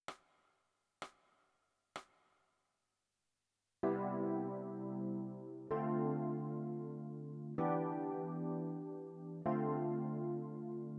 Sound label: E Piano